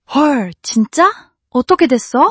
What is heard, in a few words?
surprise